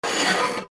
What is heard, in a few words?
scratch